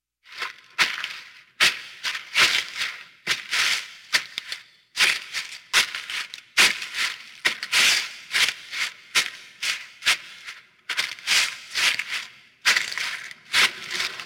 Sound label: Footsteps In Forest; Halloween Havoc - Sound Effects; Ktel